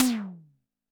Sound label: TD-20 Tom B, Roland TD-20 Toms